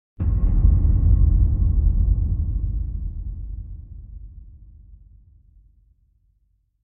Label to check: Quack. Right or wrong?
Wrong